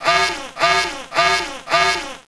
buzzer

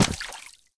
splash

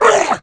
pain

